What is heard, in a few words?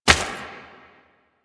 Step Dirt, Footsteps